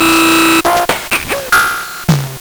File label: Nintendo Gameboy LSDJ